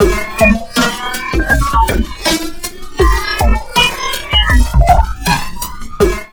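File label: drum loops crazy processed, 80 bpm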